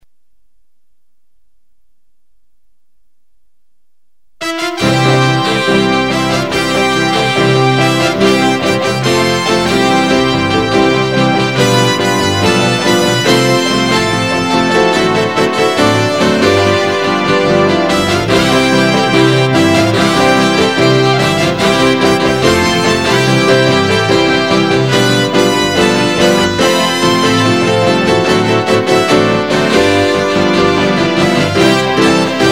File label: Sax no Drums